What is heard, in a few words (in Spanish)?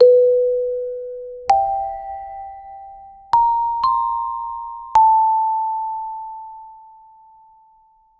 música de terror y suspen; suspense